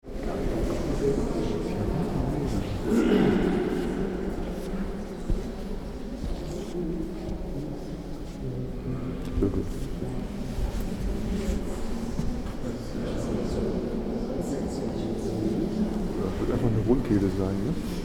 Room tone ambience in Bangkok National Museum

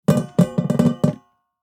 Pedal bin broken rattle; household

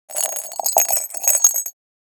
Christmas tree glass ornament bell

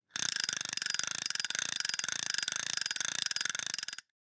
Fishing rod spinning reel

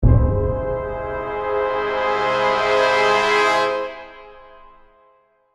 Dramatic orchestral hit with percussion and brass

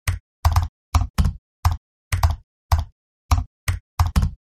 Simple text typing; shorts sound effects

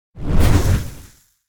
Dragon fire breath short

